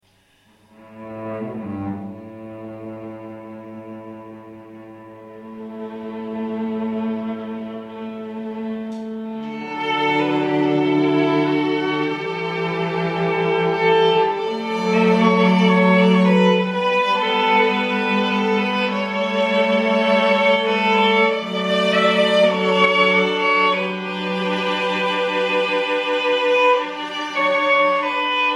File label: Oboe